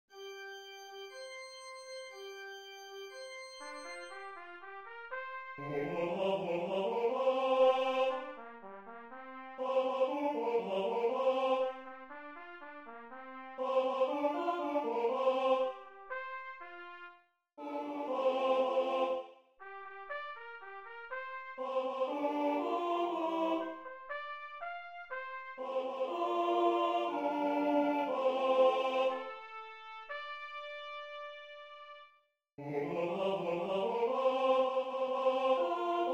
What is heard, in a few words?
halleluja tenor